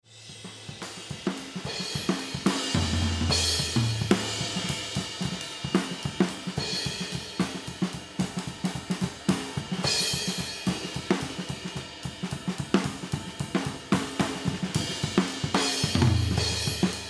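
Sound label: Mix Drums overhead EQ On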